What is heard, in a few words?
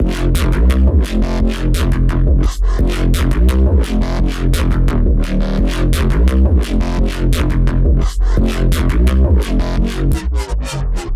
172 F Synth Bass